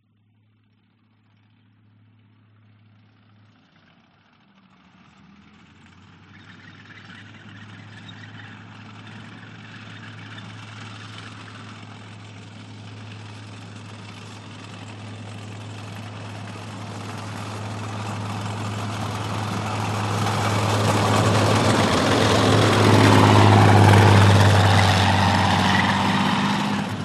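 warfare tank matilda mark 2 1939 approach pass by close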